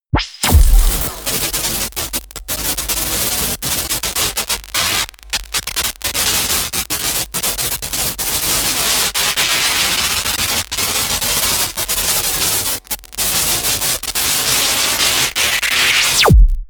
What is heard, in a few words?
promo pad; krieg waffen; laser